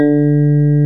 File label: DIGITAL SYNTH; OMI - Universe of Sounds